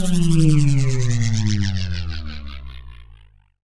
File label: Warp Portal Close